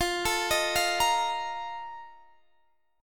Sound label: A sharp Major 7th Suspended 4th